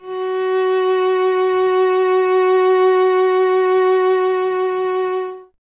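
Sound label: strings cello F#; Miroslav Vitous; Solo Instruments VOL-1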